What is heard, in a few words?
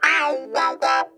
box wah, funk guitar; Zero G - Funk Guitar